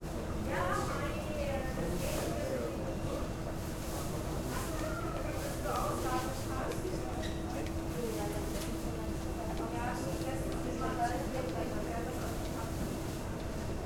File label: Frankfurt Airport, Airport & Plane Ambience